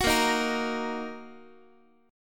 A sharp Suspended 4th Sharp 5th A sharp down Guitar; strummed; JGuitar